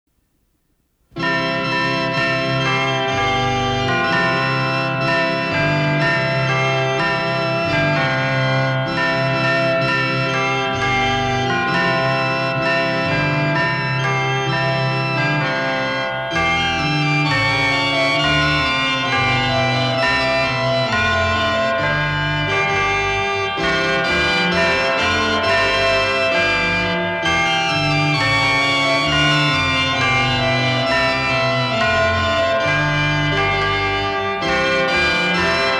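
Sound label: Christmas Organ & Chimes - Angels We Have Heard On High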